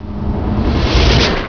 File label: Flamer